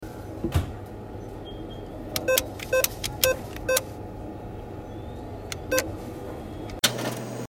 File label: Soundscape